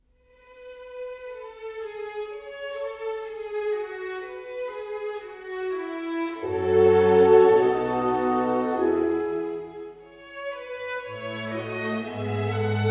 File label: Violin Concertos, Violinkonzert; Mozart; Sondeckis; Nr. 5 Adagio